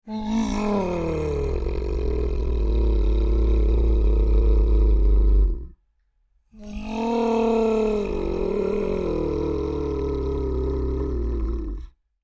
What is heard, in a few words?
Creature Large Whines Sad; Monsters; Horror